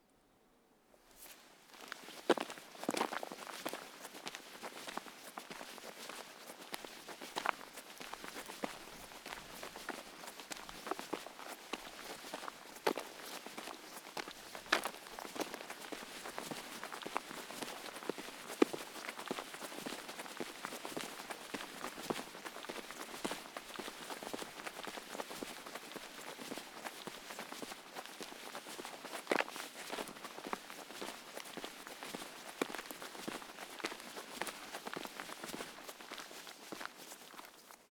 footsteps on grass gravel cloth; foley

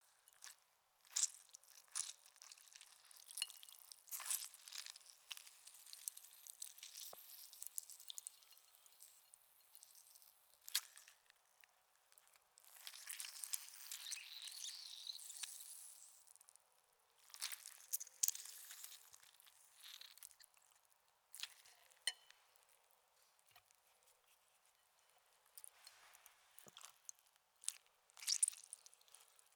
orange squeezed; foley

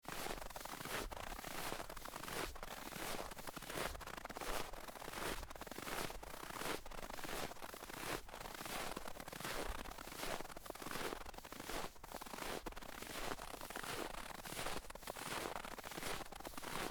footsteps snow two people; foley

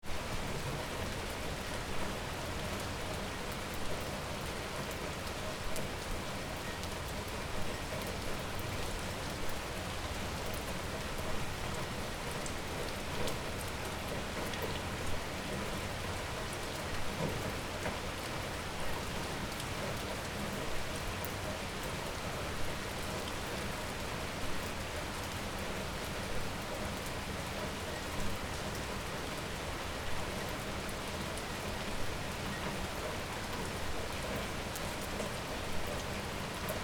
rain car pass wet road; spoteffects

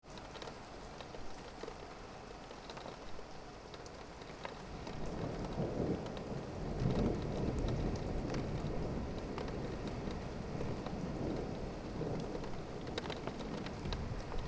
Rain out of window thunder; atmosphere

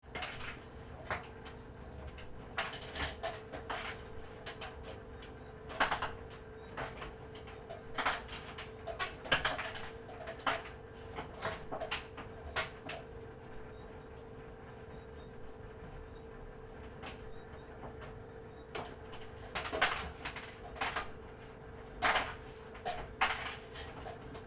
dryer; spoteffects

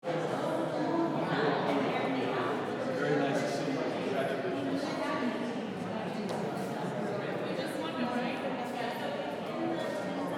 lobby museum english walla; atmosphere

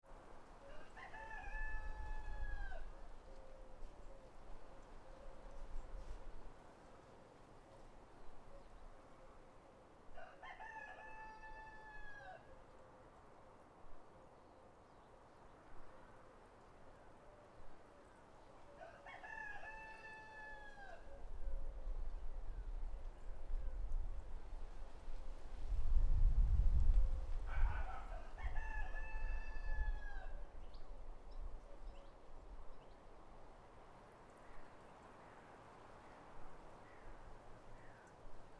country birds crows flies roos; atmosphere

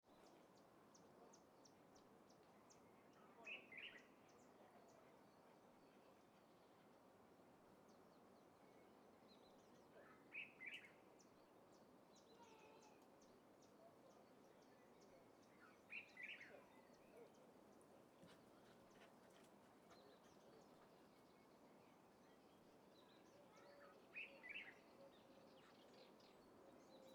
country birds crows flies roos; atmosphere

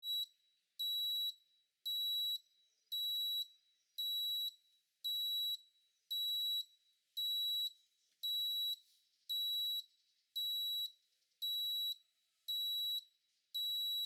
electronic alarm; spoteffects

